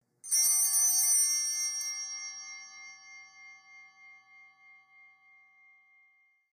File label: Ring Four Bell Altar louder